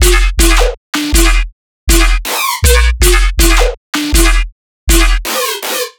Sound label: bass loop 160 E; Virtual Riot